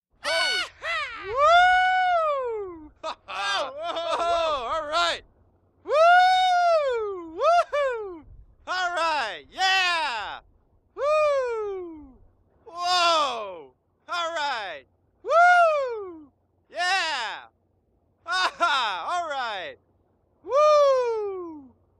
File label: Yahoo Two Men Yelling, party